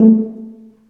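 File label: brass tuba C, staccato; Solo Brass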